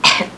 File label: cough (female); Sound Library 2000 - Wayzata Technology; People